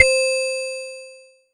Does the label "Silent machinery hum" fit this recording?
No